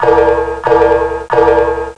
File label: alarm repeating, cartoon sfx; Sound Effects for Multimedia - Volume 1